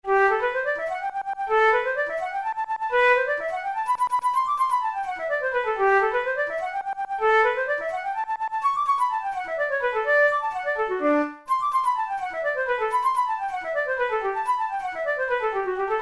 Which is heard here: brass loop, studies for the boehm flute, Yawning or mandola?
studies for the boehm flute